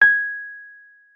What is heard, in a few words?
WURLITZER G#6; Xsample VOL-1; WURLITZER RS